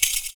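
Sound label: PERC, percussions; Univers Sons - Raricussions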